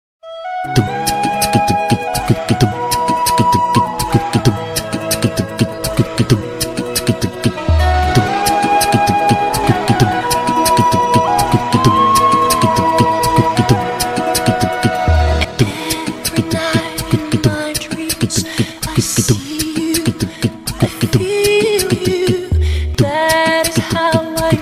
flash funk

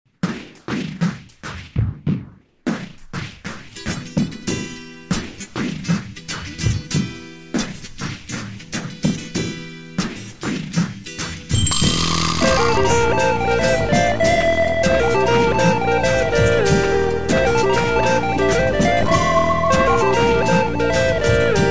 instrumental

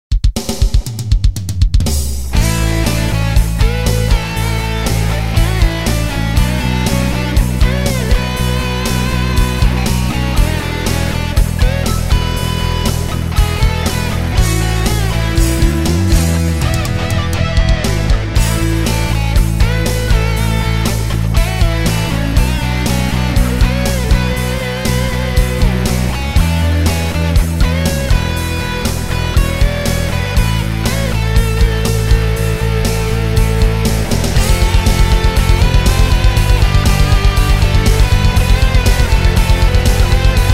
instrumental

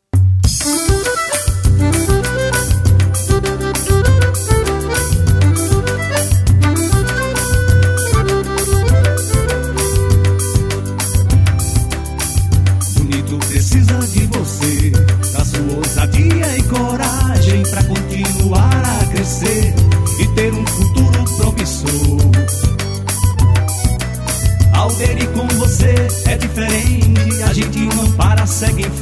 jingle; prefeita

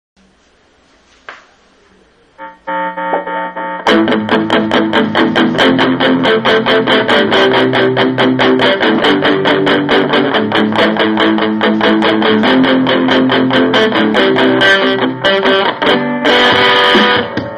instrumenal

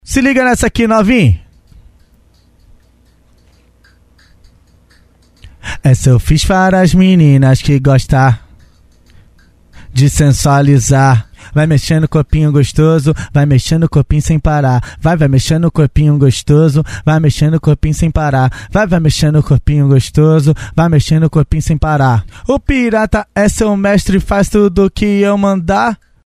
acapella funk

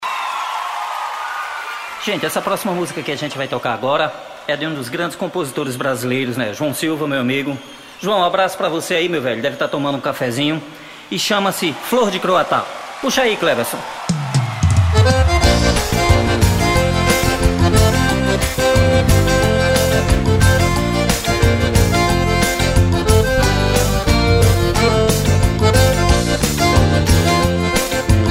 drums

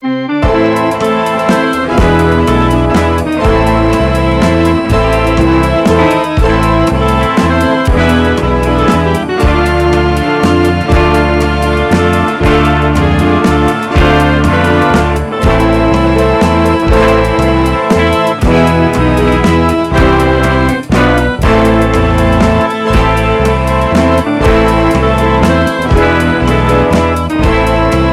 waltz

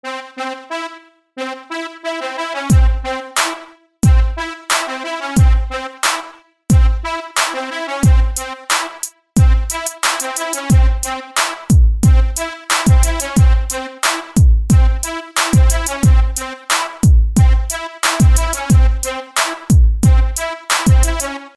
beat

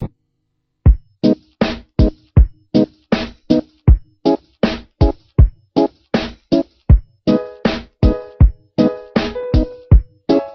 funk

